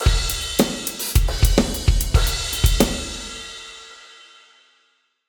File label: defeat drums; smite; raijin